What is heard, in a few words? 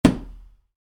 Wood, Light Impact: Plastic; Wood Sound Effects; Wood (Sound Effects:Materials); Stuart Duffield